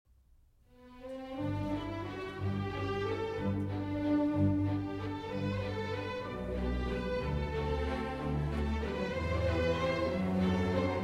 Serenade For Strings in C Major II. Valse. Moderato, Tempo di Valse